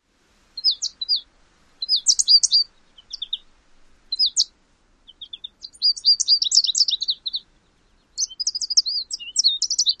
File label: song